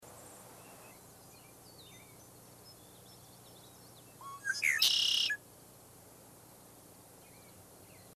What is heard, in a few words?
Red Wing Blackbird